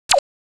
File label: Button; 特酷声音素材库; 按钮